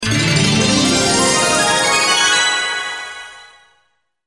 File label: Final Gliss Tone